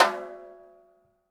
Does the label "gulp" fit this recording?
No